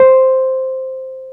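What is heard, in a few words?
piano, Wurly, Keys of the 60s and 70s; Roland LCDP10 Keys of the 60s and 70s 2; Wurly 200B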